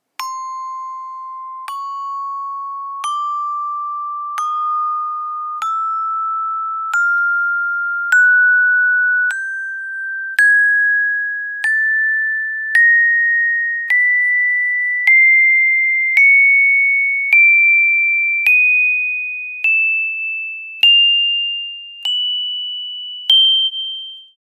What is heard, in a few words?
Rubber Club